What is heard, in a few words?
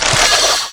saw flesh, circularsaw hit; weapons